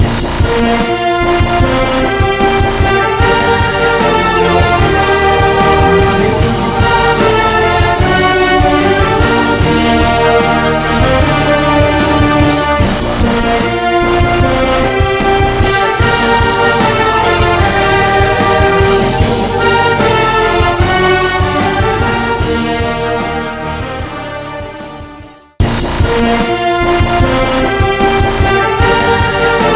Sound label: Coast Jingle Full; Lego Jurassic World; PS Vita